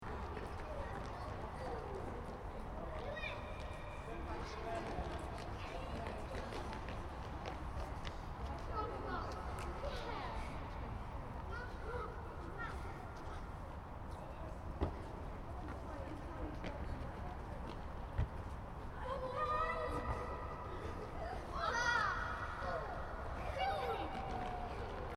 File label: Sports Hall, Interior: Ambience, Kids Five-A-Side Soccer Game, Reverberant; Backgrounds; Sports Sound Effects; Sports (Sound Effects:Backgrounds); Stuart Duffield